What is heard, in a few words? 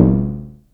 SYNCUSSION